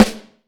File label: CHT, Vintage Snare Drum; Q Up Arts; Johnny.C's Vintage Snare Drum; 5.5X14 SLR